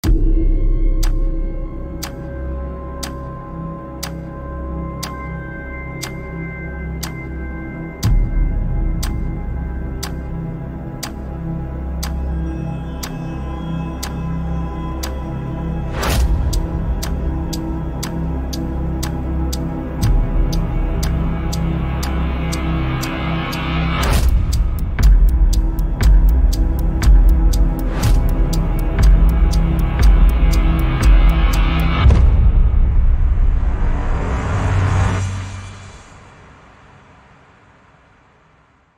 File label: tension